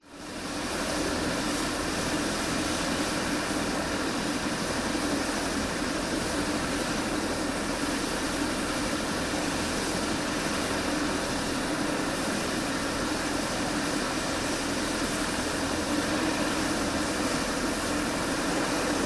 helicopter idle hover take off away eurocopter as350 b2 squirrel